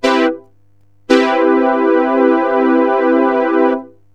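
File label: major 7th, synth chime; STRNGBRS; cubase; CUBASIS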